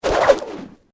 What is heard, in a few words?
Cable Swoosh Wire, Foley, Cable, Swoosh, Wire, Whip; Whips (Sound Effects:Objects); SoundEffects-BlastWave